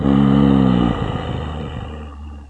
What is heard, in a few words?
neutral, zombie